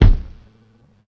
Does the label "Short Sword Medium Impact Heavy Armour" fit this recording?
No